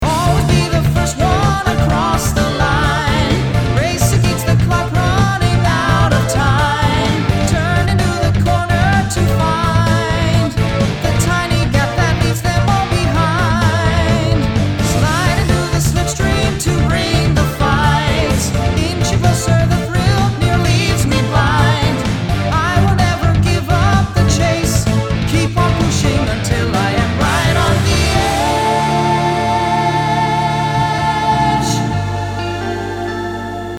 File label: chorus; Greatest Driver